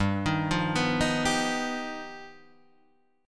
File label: G Augmented 7th; G+7 arpeggiated; Standard; JGuitar